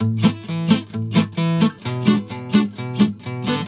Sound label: GUITAR, Balkanish Traditions